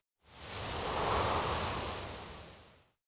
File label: WND GUST